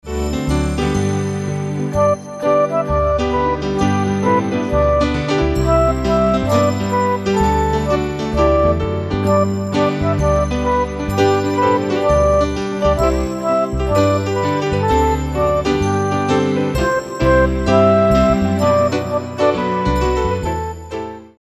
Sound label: Instrumental Santa Lives; Christmas